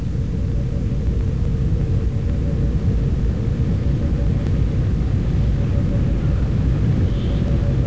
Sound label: bkgrnd